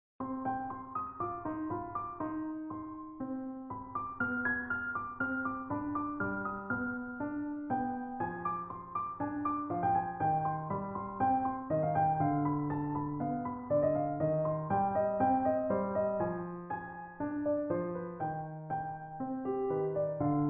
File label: Ditty in C minor; swap experiment